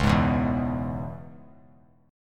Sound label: Db Minor 7th Db down, chord; strummed; JGuitar